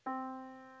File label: yamaha Disk